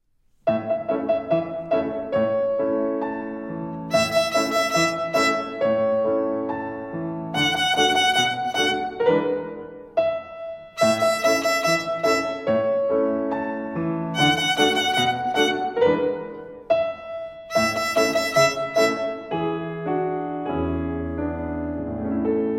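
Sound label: Rhythm on E and 1, Violin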